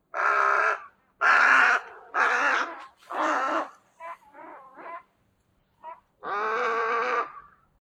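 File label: little egret song, birds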